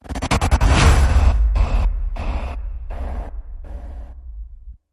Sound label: Hit Binary